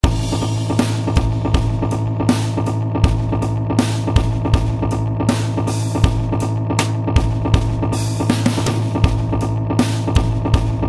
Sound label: Jazz Guitar; Ueberschall Jazz Guitar